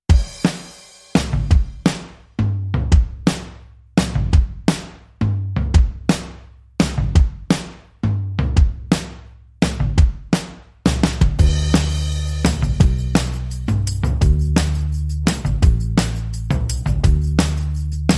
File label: Drums and Bass Only